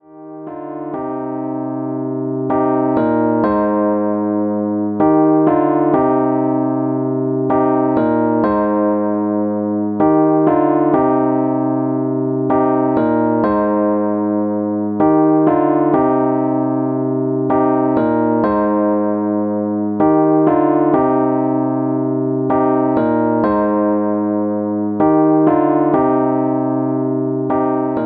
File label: Rhodes chord, hip hop chords; rhodes chord 11